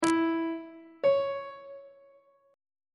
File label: relative minor of e major is c sharp on treble clef